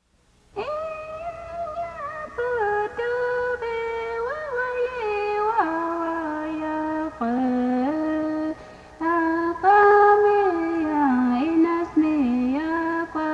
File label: Humming Bird Song